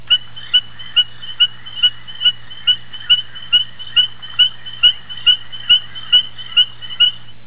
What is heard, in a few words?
common squeaker frog